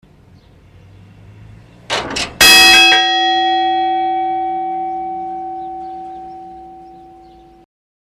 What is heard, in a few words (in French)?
cloche; Autun, cathédrale